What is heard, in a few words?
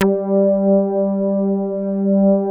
Prophet 5, G4; Hollywood Edge - Giorgio Moroder Rare Synthesizer Collection